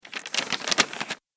Turning Paper Pages; Paper Sound Effects; office

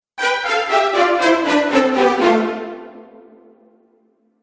Action Film Dramatic Event, musical

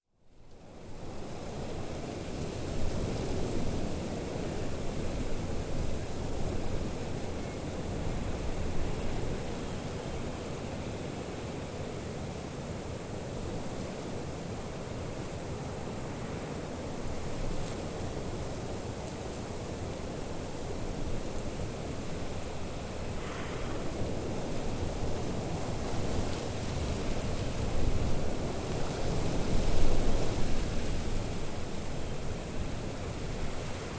Wind hilltop open with some birds, nice gusts; Wind Sound Effects; Weather

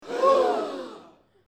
group gasp, Crowd-Small Group Gasp; Small Crowd, Mixed Group Of Men And Women Gasp In Surprise; Exclamations; Voice

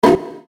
Hit Snare Rim Shot Reverb; Sound Effects:Sound Design; Blastwave

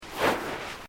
Footsteps Boots Sand Deep Step; Footstep Foley; Human

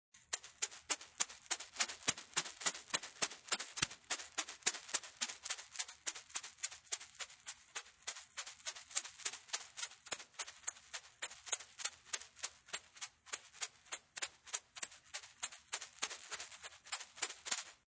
Rattle, Shaking a rattle.; Musical

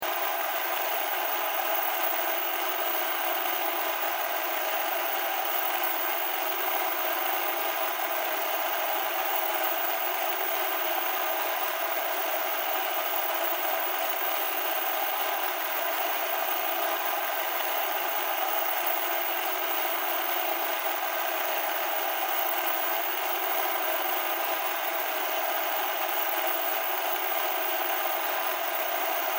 Technology Television Static; Electronics

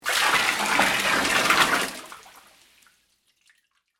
Water Pour Into Tub; Pouring; Sound Effects:Objects; BlastWave

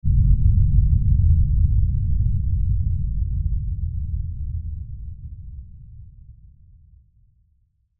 Horror Impact Lfe Hit Pound Low; Blastwave; Sound Effects:Horror

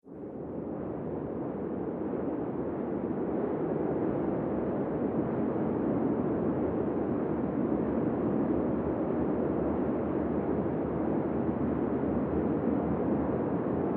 Drone Dark Tides; Drone Sound Effects; sound design

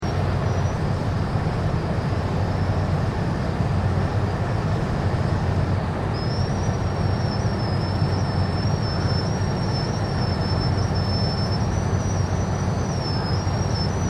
Industrial Conveyor Mining Large Operation Loop

